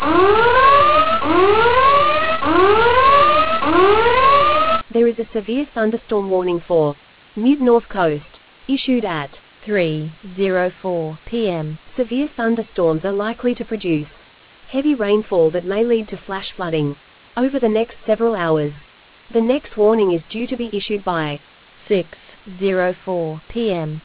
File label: storm audio